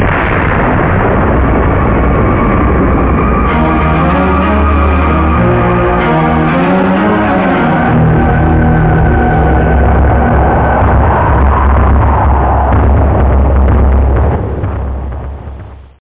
intro music; Role Playing and Strategy; Citadel of Dead

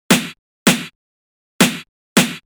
snare, Drum+Bass, 160

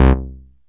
Raw Pulse; MoonFish

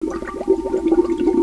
bubble; Wired for Sound Pro CD; Aristosoft, Inc.

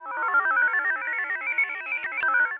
loop

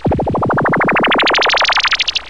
spin; Duke Screw'em 38D

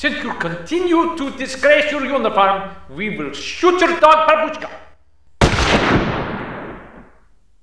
DEFEAT; CD Action 28b; east front

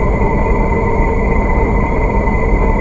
warp engine; Earth and Beyond; EA Games

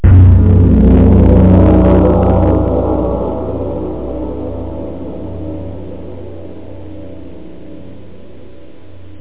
GONG; MR GONG

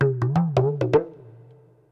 PERCLOOP ETHNO 125; eJay

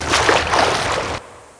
swim top; thief

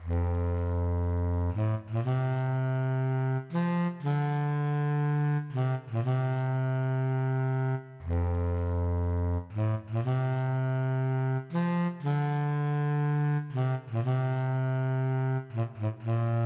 beeper

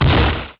build; PHARAOH

